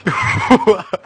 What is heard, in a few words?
laugh